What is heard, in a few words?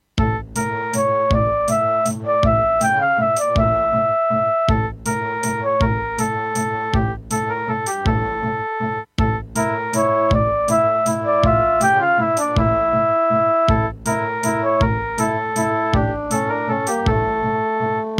Diamond Monster Sound 0.5MB